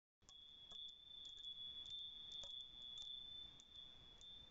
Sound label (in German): Klangspiel Engel Koralle rot